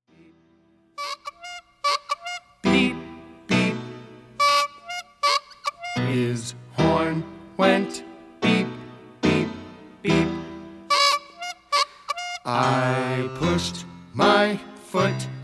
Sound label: Beep Beep